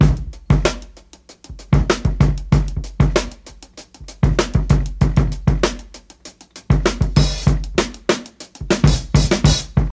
97 bpm drum groove g key, Free breakbeat sample - kick tuned to the G note. Loudest frequency: 1002Hz; drum loop